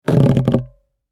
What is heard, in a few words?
Foley Balloon Rub Short; Balloons; Cartoons